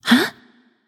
confused light; smite; voicepack; hel

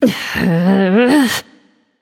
Grumble; smite; nemesis

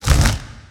debuff; Smite; Cabrakan

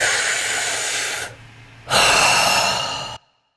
breath; Smite; athena

